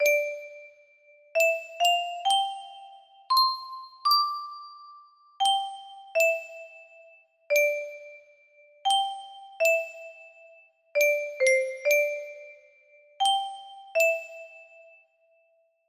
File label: music box melody